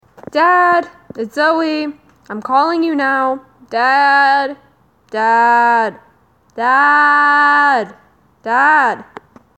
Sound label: ringtone